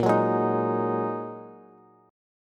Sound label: Bb 7th Suspended 4th Sharp 5th Bb down Bass; strummed